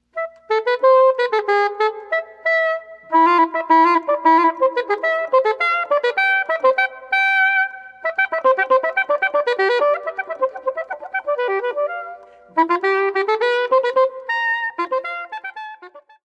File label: flute